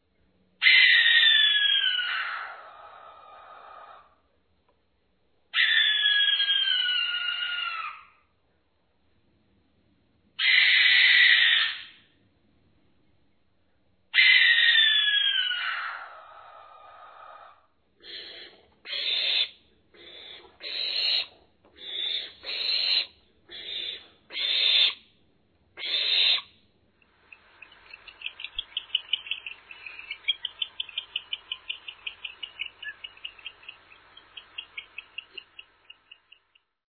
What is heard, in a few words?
Barn Owl, bird calls; Envirothon Jr. High Resources; Jr. High Enviro Wildlife Resource Packet